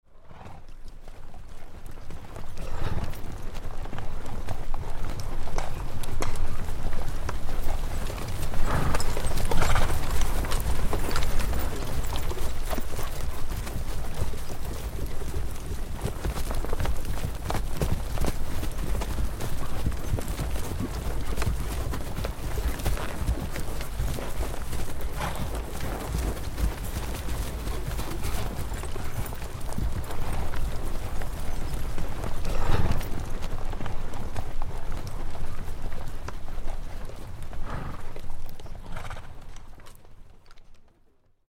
50 Horses Trot By 2 Lines; Historical; Hollywood Edge Historical Series; War Horses Armies & Weapons

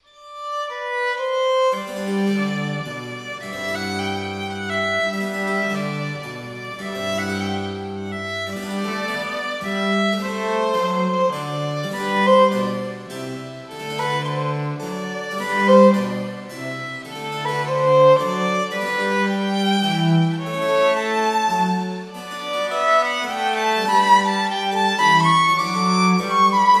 Sonata in e minor for Violin and Continuo

